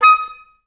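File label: oboes staccato D#5, orchestra; Best Service; Peter Siedlaczek's Advanced Orchestra; OBS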